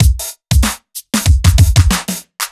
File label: Heavy Swing 95 D, 95bpm; off the grid